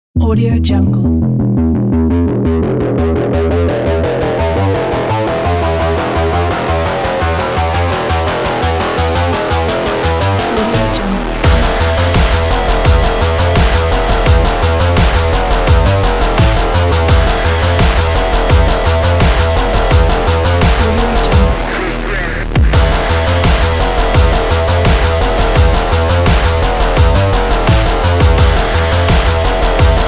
dark techno destruction

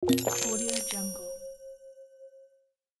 magic potion

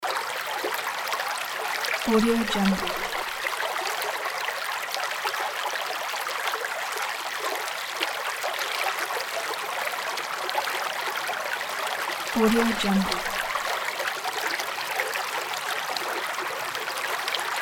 spring stream ambience loop; soundfood

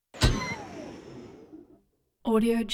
whompy pneumatic blasts short bursts tubular

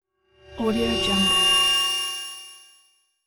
high pitch ring whoosh; hollywoodedge

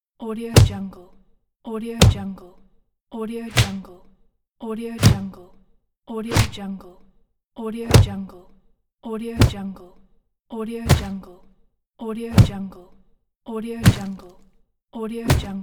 punch

